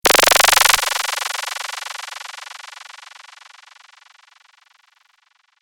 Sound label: pro one raygun